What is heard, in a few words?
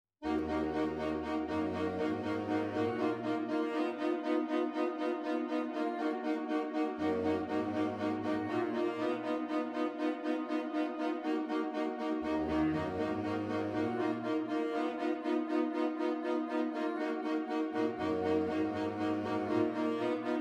Sax Quartet, MIDI